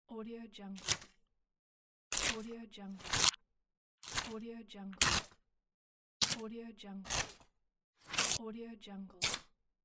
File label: pad of paper tear off sheet